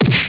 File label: PUNCH